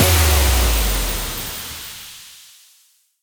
big Win Start; fireflies

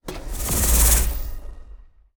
wild snake; rise of maya

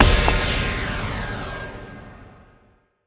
pick Generic; jungle spirit

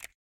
button Hover; superstriker

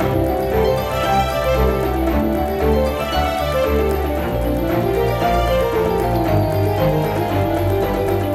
big win theme; wingsofriches

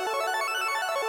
arp Loop; hotline2

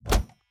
reel bounce; spinsane

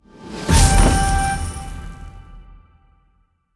chest Open; wild bazaar

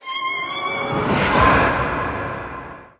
anim Scatter; bloodsuckers

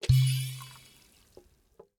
spin button click; golden tavern

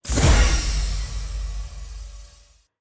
mega Win Text Change; codex of fortune

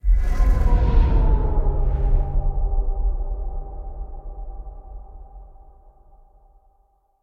free spin To Main; mercy of the gods

